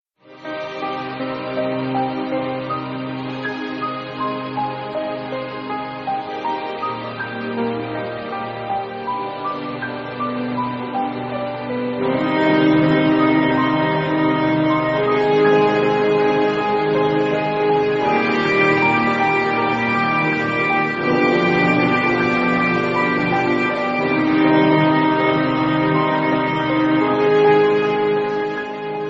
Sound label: main music